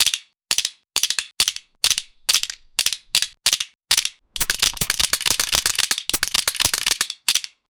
cartoon gun reload

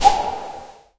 short soft puff sound air

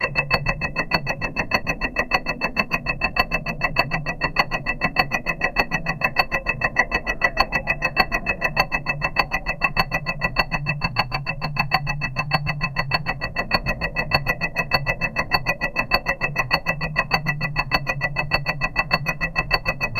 faint whispering sound, clock

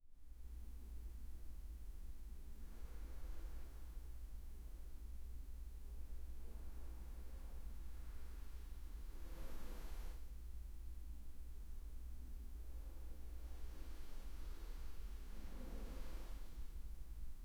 bedroom, sound-effects

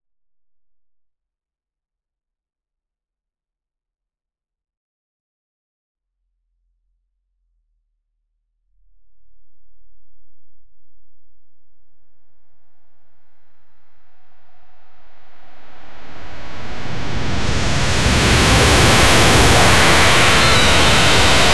a fantasy mana charging; beefy

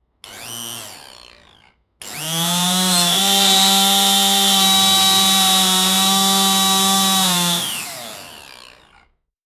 hammering and cutting of, woodworking

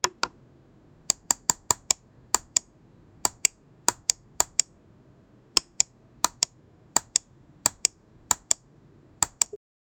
sound where butt, hardware

